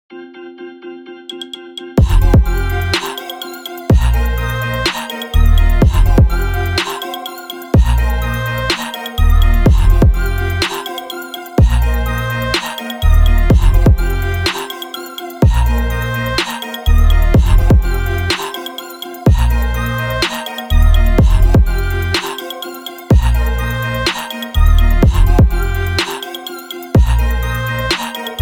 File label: afro trap fusion beats